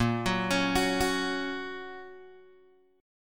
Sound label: A sharp Minor Sharp 5th A sharp down Guitar DADGAD